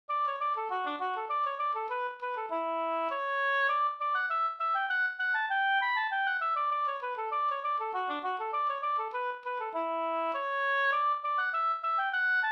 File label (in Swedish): slangpolska